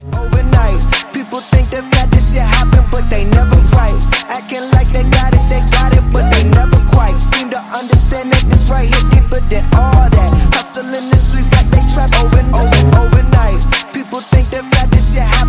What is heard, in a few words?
english ringtone